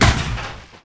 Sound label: impact; Far Gate